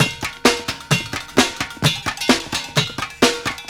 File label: Street Groove, Ambient Grooves